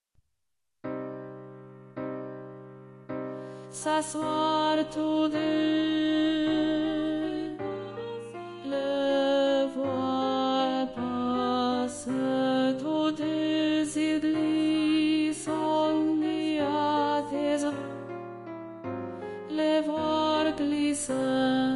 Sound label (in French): Au bord de l'eau alto; Gabriel Fauré